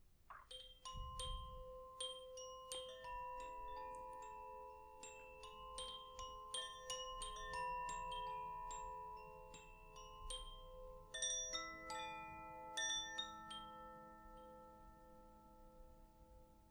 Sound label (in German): luft koshi klangspiel